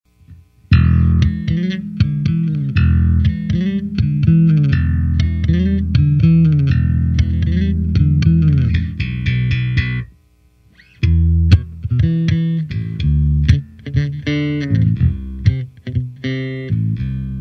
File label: Mxr bass di+